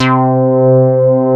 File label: MOOG BASS C4; Best Service Dream Experience